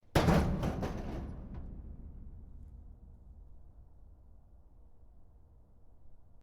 metal trash can filled

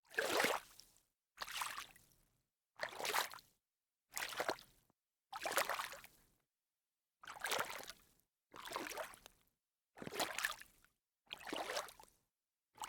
sneaker in shallow water series

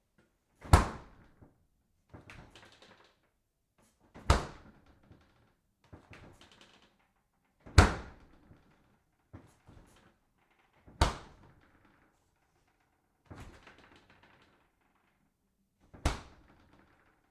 fridge door swing open

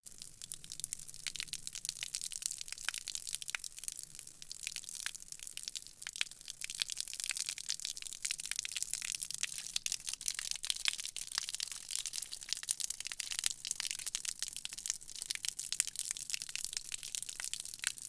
water hose on concrete

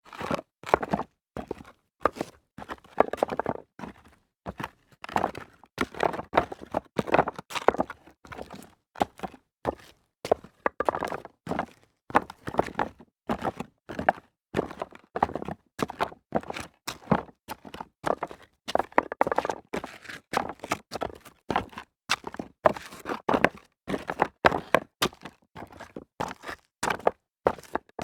walking on rock debris

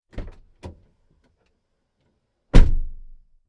opening closing car door

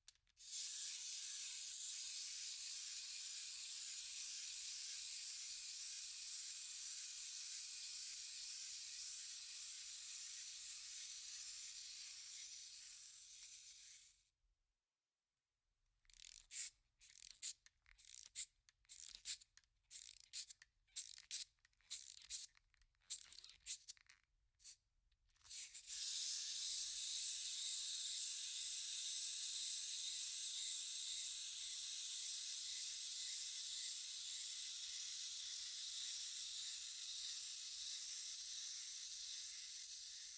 wind up toy

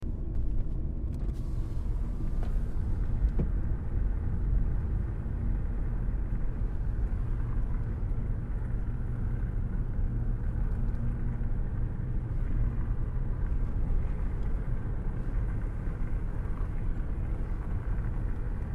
car cruise hand out window

